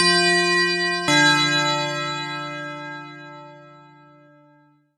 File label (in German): voralarm